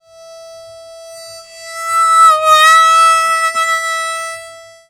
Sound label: Harm 098bpm A, Harmonica